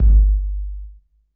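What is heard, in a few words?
wet room kick 808; Masterbits - Soniq Elements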